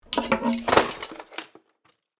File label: Kitchen drawer cutlery rattle